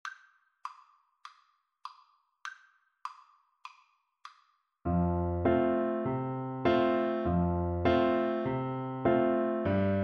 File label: merrily we roll, Tin Whistle